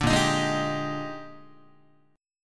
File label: Bb 13th Bb Guitar, chord; Bb13 strummed